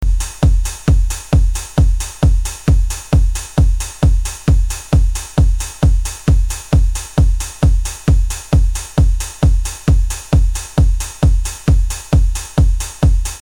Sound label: Pump (Locked Groove)